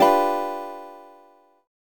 Guitar Strum; the legend of zelda phantom hourglass; Freedle

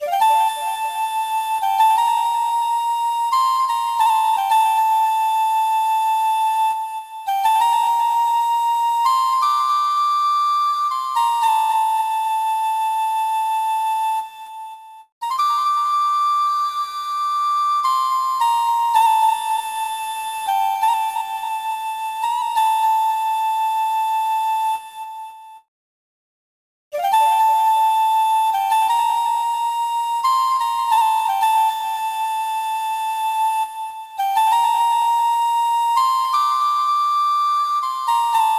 Snowfall; legend of kage 2 nds

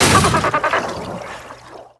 Cage Break; angry birds rio; Pink Dolphin Cage Break

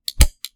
Switch

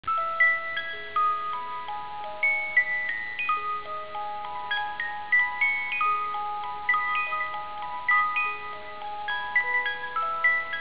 Music Box; Five Nights at Freddy's; Ultimate Custom Night; Stage01 (Music Box 4)

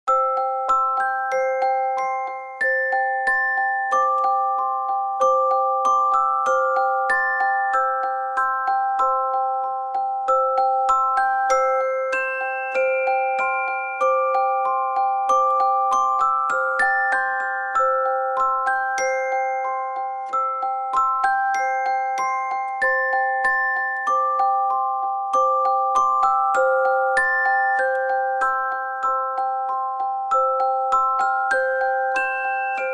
Lullabies; The Sims 4

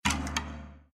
Critical Stop; Windows 7; Calligraphy

